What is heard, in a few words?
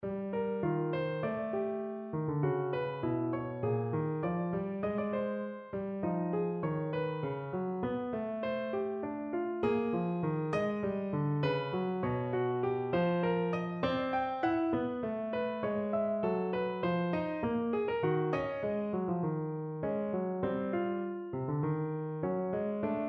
Quartal Example